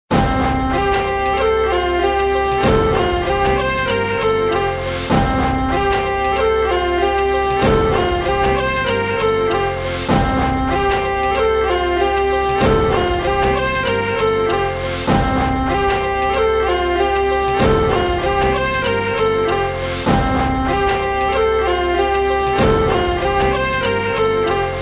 Marathi Music Ringtone

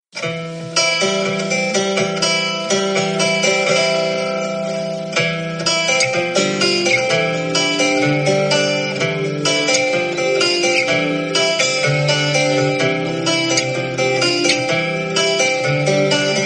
banjo

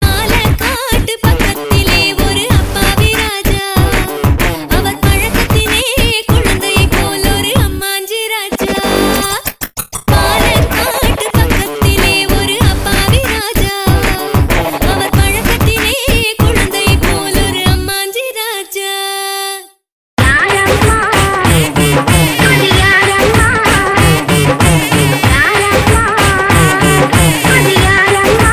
Remix Ringtone

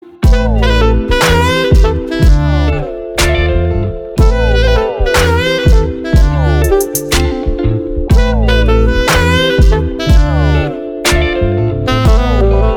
Slowed Ringtone

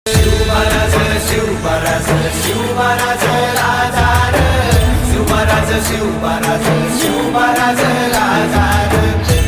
Ringtone

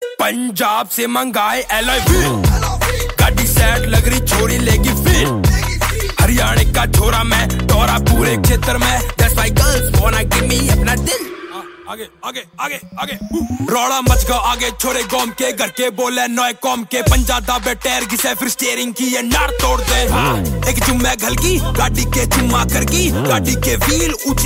Ringtone

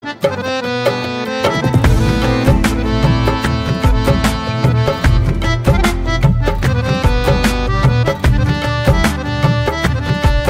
Instrumental

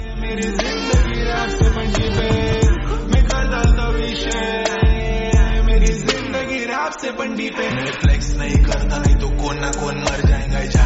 Rap

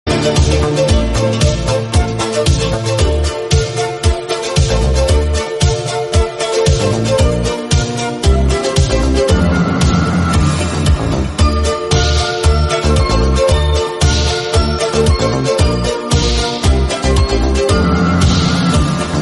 Instrumental Ringtone

